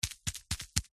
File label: foley packaging soft taps; zapsplat